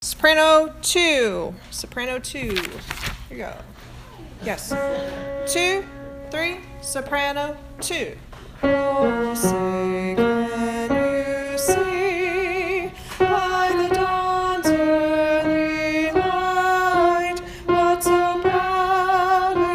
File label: soprano ssa national anthem